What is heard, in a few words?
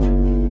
LIQUITAR; Spectrasonics; Metamorphosis